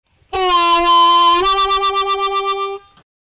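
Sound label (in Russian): губная гармоника блюзовый мотив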